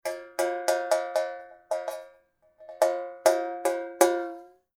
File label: pot hitting